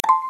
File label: positive short